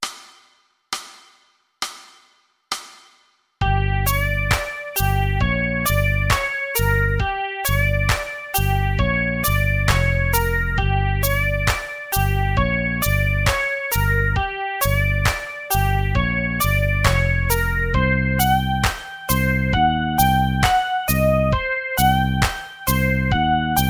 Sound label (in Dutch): langzaam